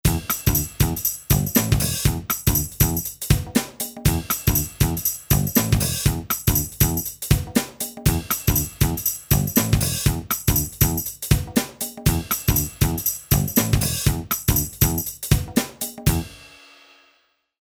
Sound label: Funk